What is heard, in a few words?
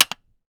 CLAPPER, American Natives; E-MU Formula 4000 Series Vol. 4; Earth Tones